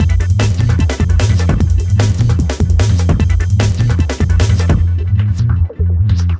Hardcore Breakbeat, 150 Fmin; Big Fish Audio; Hardcore Breakbeat Action; Trukin

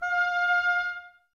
OBOES F; Miroslav Vitous

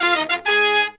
Pop & Funk Brass, 096

